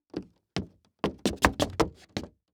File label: Foley Footstep Cowboy Boots Shuffle On Wood; Foley Footsteps